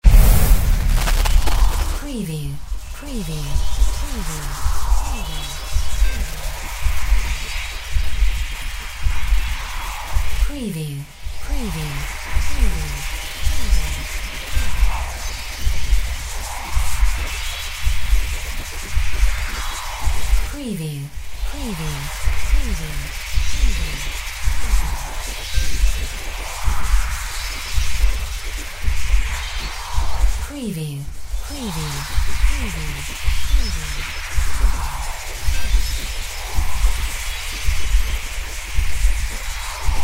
SCIFI MAGIC HEAL FULL; windy beach audio

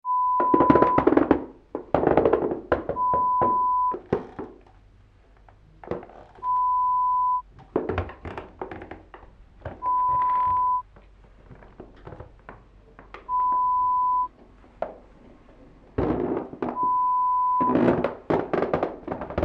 Creaky Floor

